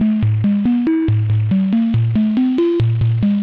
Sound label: monosynth arpeggio; melodic loops; synth and electronic loops; checkered girl